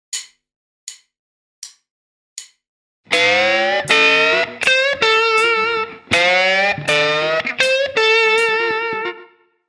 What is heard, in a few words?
blues tricks, guitar; eight essential lead tricks